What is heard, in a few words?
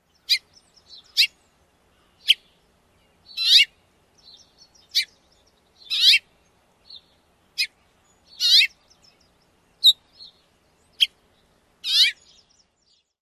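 House Finch, various calls; NY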